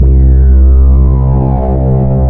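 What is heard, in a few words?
sweep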